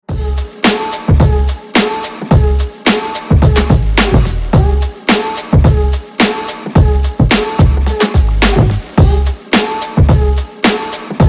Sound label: 90s love ringtone